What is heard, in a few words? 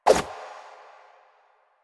Run Whoosh, Footsteps; Warframe; Lasrian; Lasrian Soldiers; Foley; Lasrian Butcher